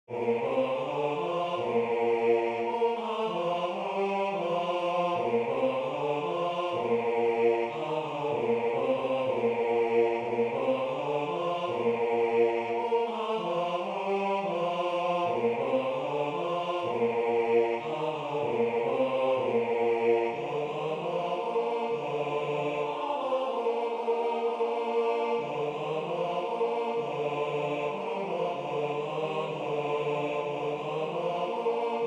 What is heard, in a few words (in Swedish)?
BAS